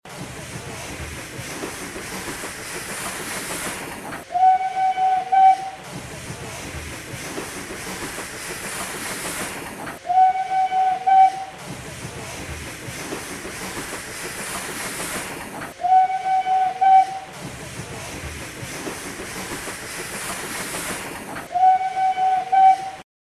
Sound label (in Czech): vlak jede a houk, Dopravní prostředky